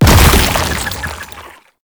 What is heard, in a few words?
Rolling Rot Proj Explosion; Enemies; Abilities; Warframe; Proto Infested Babau